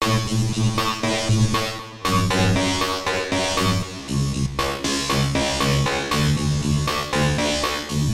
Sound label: rhythm; loopzone2